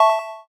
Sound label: inner voice